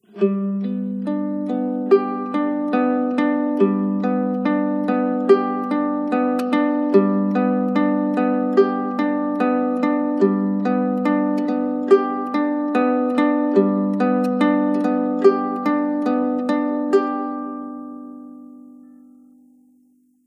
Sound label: Sunrise Guitar, bell alarm; Mudita Pure